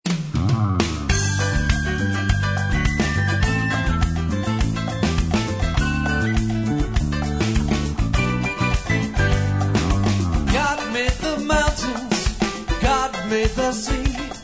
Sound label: yodel; Yodel lay e